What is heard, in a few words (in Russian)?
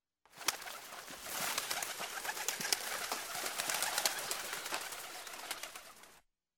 vzlet stai